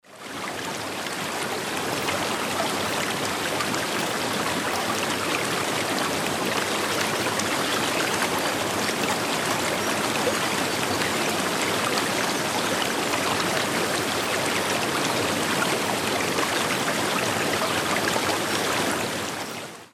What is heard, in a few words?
Forest Stream